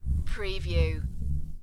Billiard, Pool, Snooker - Close up sound of ball rollling on felt